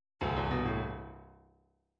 midi fills rock

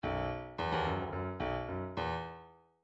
bdf midi file grooves rock f rock f

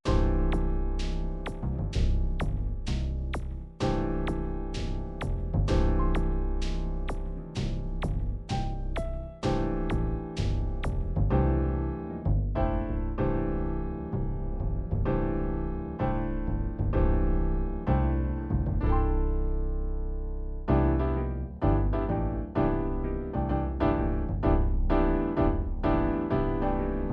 Jazz Cool Jazz, midi

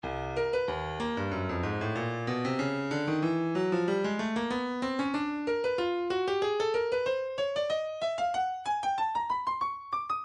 breakbeat midi, 115 bpm; R&r Street A; Stylus Breakbeat Midi Files

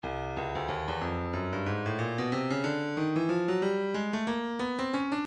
stylus breakbeat midi 092 bpm

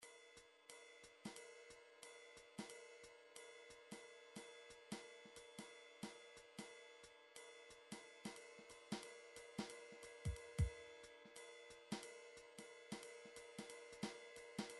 drums half

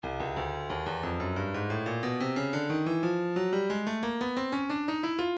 breakbeat midi files 090 091 bpm; hipping e; Stylus Breakbeat Midi Files

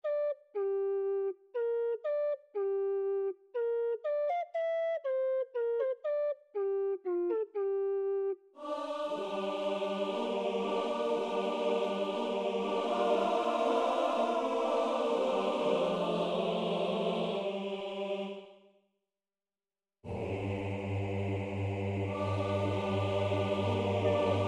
choir remix

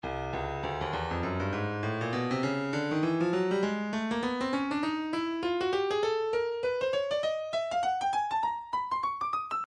breakbeat midi 100 bpm; wuzzup b; Stylus Breakbeat Midi Files

